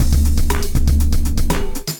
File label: Acoustified Kit, Drums; Ableton; Bomblastic; Acoustified Kits